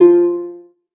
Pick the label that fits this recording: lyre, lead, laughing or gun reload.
lyre